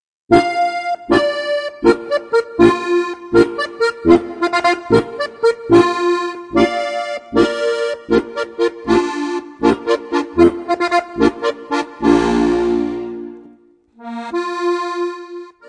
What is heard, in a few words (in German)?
hirsch tot signal; Halali